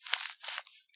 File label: quikclot loosen, weapons, ifak; garrysmod